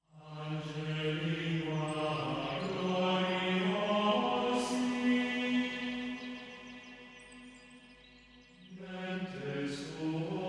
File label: Gregorian Devotion